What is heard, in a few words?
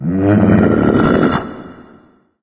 mancubus sight; doom3